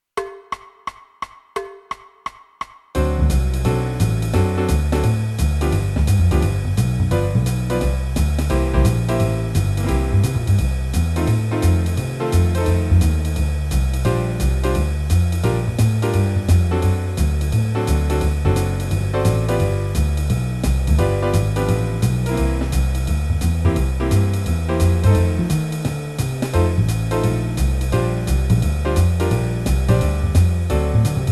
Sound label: Backing track fast